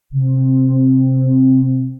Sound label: Darkened Theatre Pad, Synth Pad; Ableton; Creative Extensions; Racks